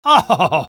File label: laugh short; spy